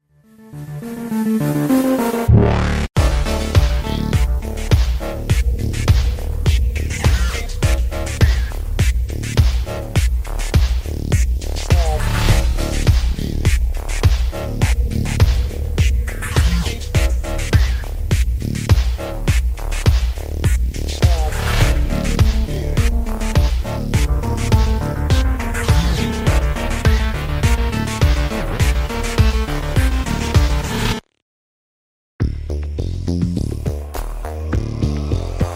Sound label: music